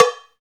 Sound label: CowBells